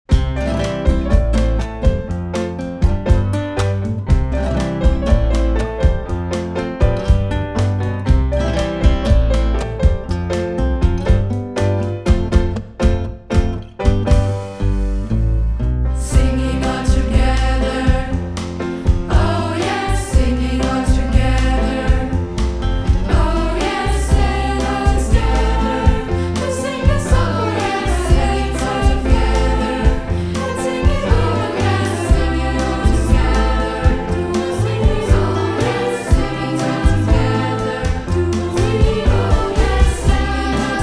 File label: Singing all together (Kanon); Singen wir gemeinsam